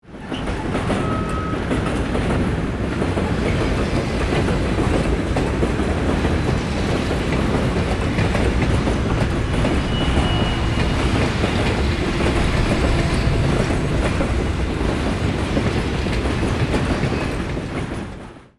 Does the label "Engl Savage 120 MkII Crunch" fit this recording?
No